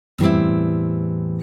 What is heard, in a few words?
Guitar close; Nokia; 8600 Luna